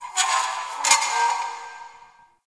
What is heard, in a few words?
Sparks; Warframe; The New War; Zariman